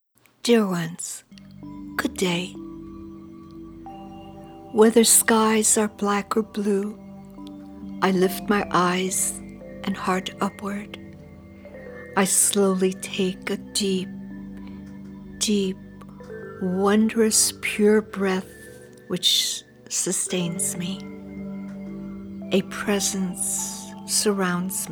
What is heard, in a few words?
Devotional Music